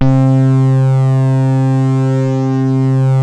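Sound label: MOOG MODULAR C4; Hollywood Edge - Giorgio Moroder Rare Synthesizer Collection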